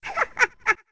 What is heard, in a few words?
Chuckle; Kotake chuckling